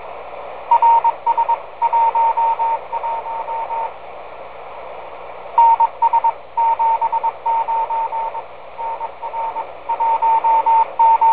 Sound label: cw telemetry 10m downlink; RS-11 CW telemetry signal on 10m downlink